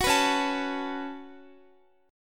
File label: Db 7th Suspended 4th Sharp 5th Db down Guitar